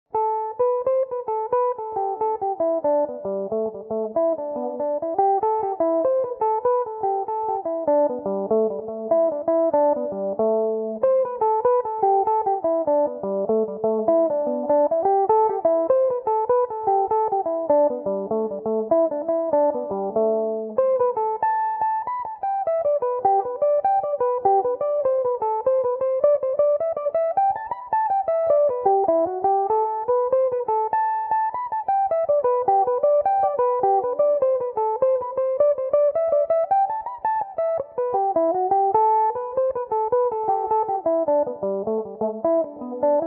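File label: A dorian, irish mandolin, tunes; sean ryans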